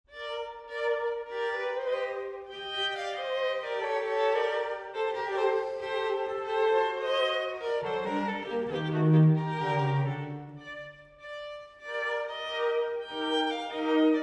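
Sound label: quartet; viotti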